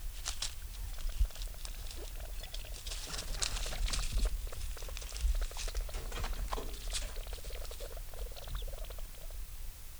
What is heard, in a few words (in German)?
aufreiten